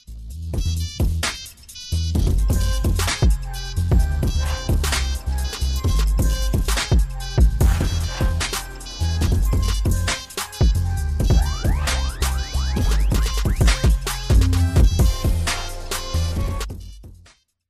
nokia love ringtone

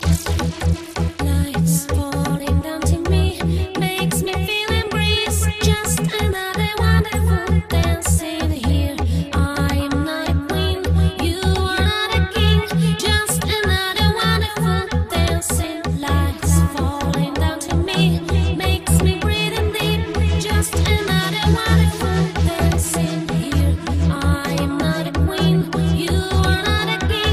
dance ringtone

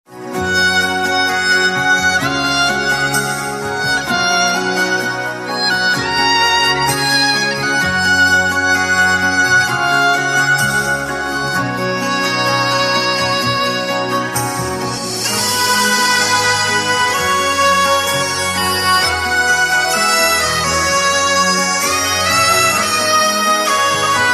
sad tone ringtone

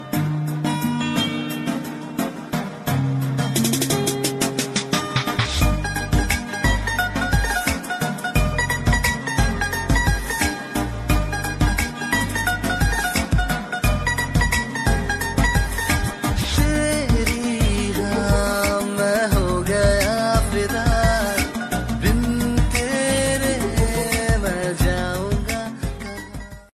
ringtone, bollywood ringtones

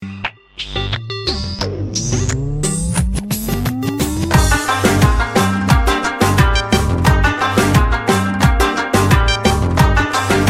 afghani ringtone

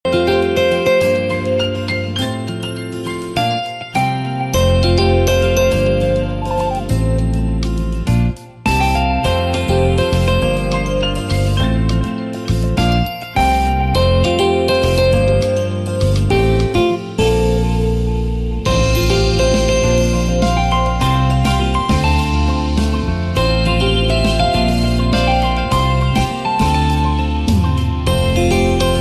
lg ringtone

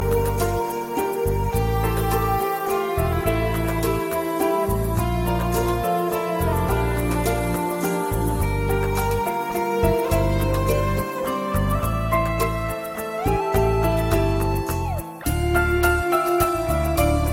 piano and guitar ringtone

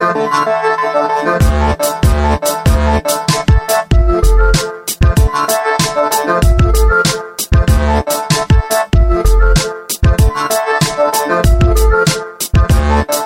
motorola razr ringtone

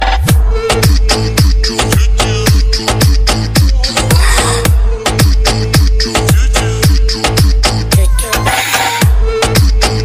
english remix dj ringtone

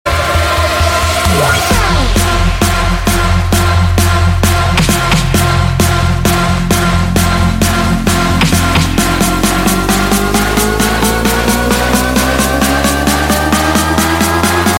beat ringtone

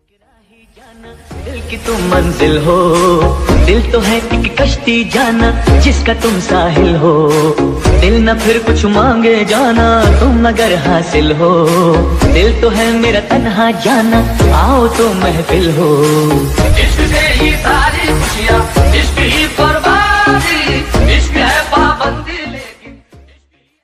mobile mix bollywood ringtone

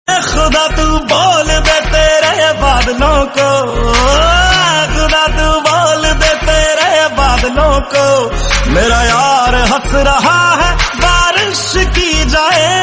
love hindi tone ringtone; bollywood

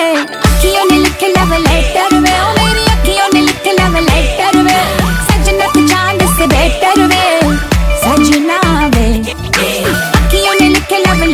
bollywood ringtone

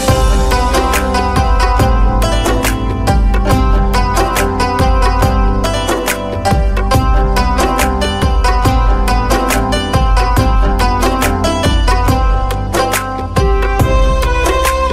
hindi music ringtone, bollywood ringtones

